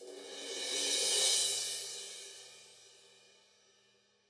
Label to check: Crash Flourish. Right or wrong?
Right